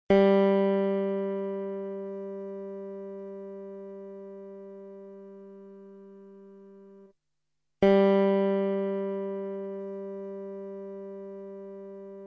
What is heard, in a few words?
Tuning note G; 10 Rock & Blues Solos; De Haske